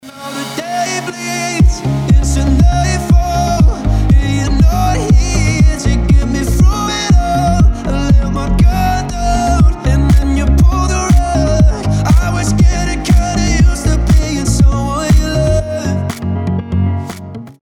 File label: remix